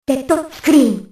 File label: dead scream